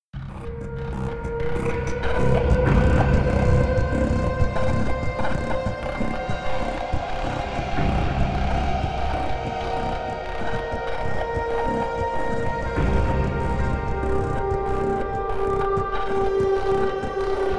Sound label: Music DarkVictory AmbientFasterTempo; Mission Music; Starcraft 2 Wings of Liberty